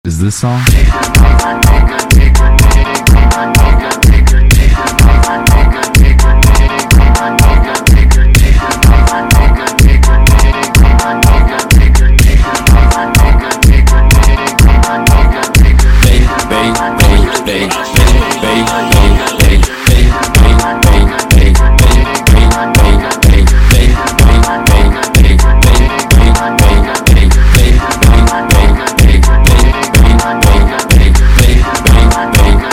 funk

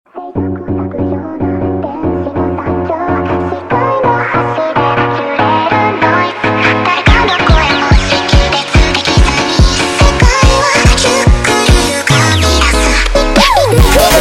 Montagem sped Up, funk

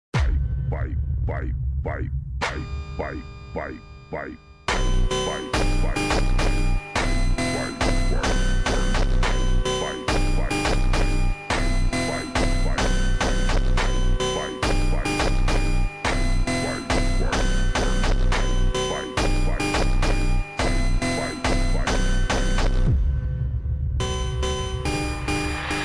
Automotivo super Slowed, funk

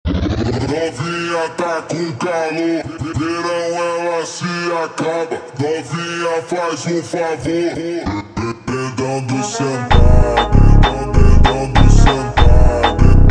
ultra Slowed, funk

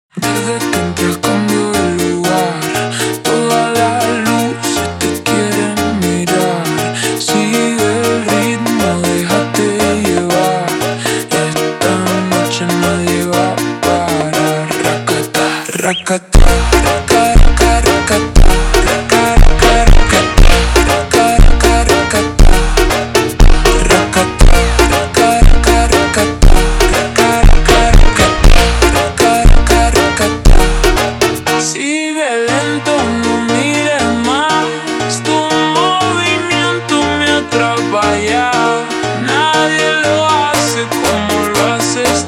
ultra Slowed, funk

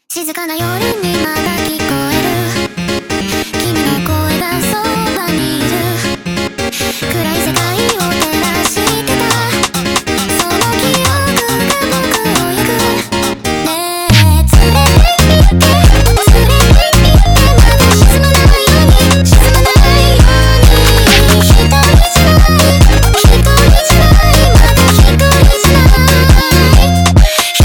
sped Up, funk

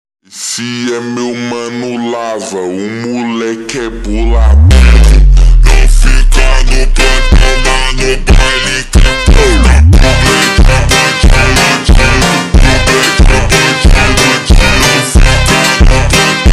Funk ultra Slowed, funk

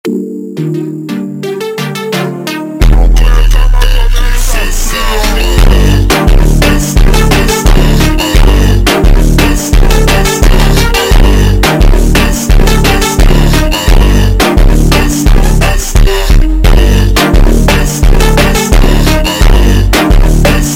Funk, Ultra Slowed

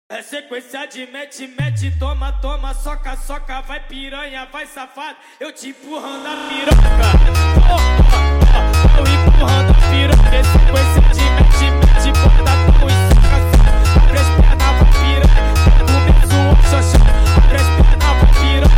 sped Up, funk

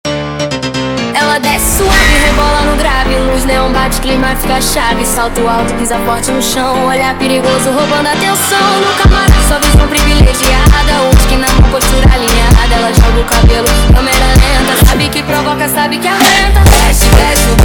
phonk music

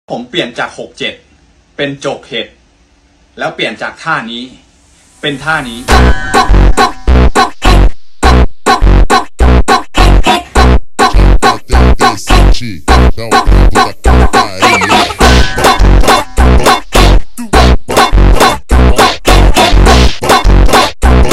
Funk super Slowed

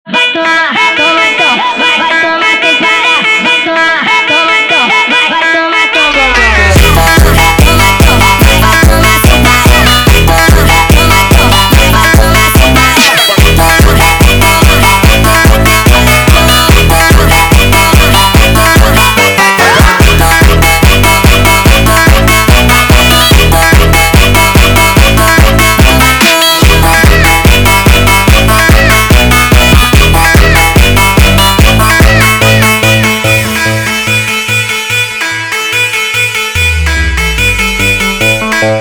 Funk sped Up, funk

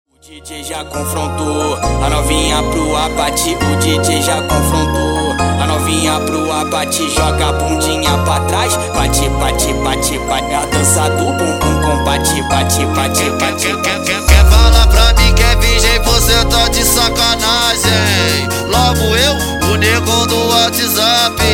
super slowed, funk

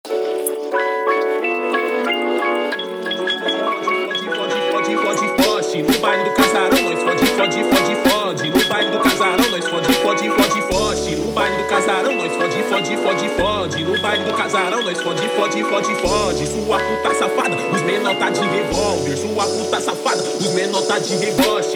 Brega, funk

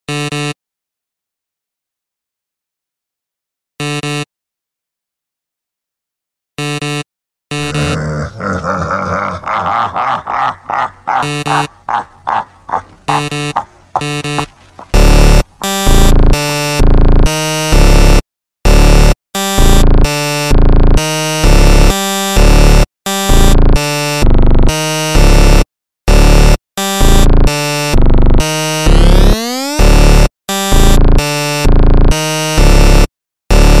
extreme Slowed, funk

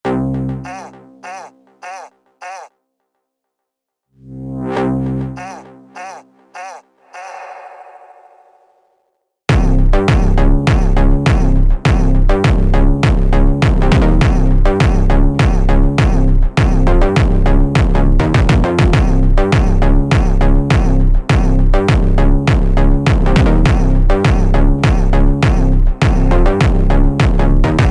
Slowed, funk

